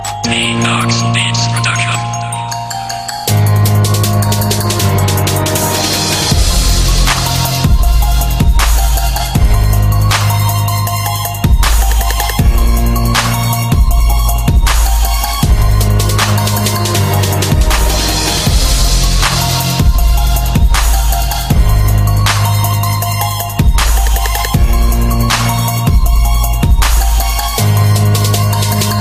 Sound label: halloween horrorbeat